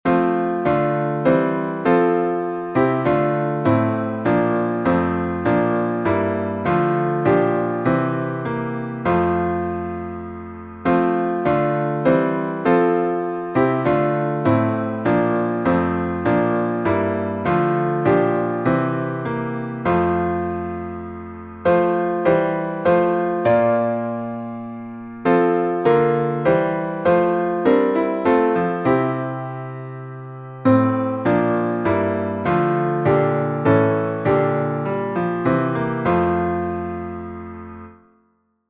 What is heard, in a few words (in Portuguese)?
salmo instrumental